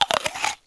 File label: apple clk on